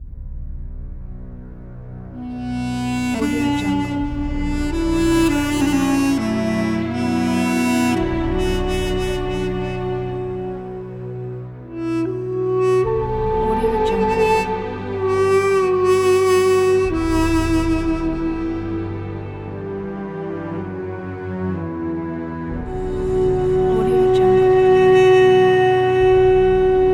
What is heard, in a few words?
lonely duduk; bluejaystudio